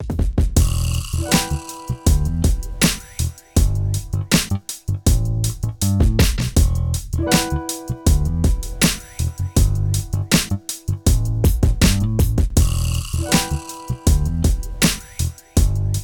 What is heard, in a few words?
Rap Loop; The Jackbox Party Pack 5; Jackbox Games